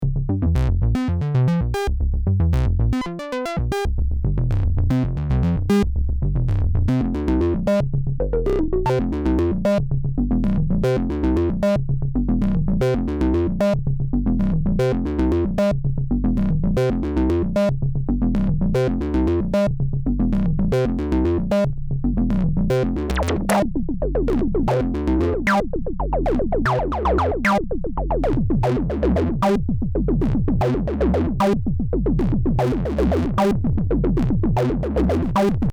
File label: system100 sync; roland